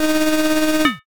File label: crane game